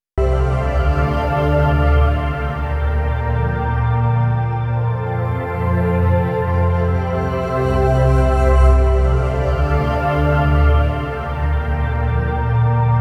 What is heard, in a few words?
Quantec QRS Pad Enhance